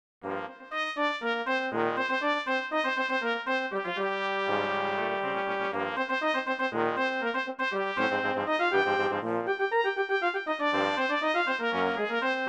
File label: Quintet for Brass I